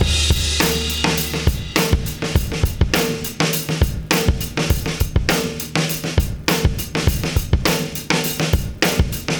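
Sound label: 102 bpm, beat loops; Zero-G; Monster Beats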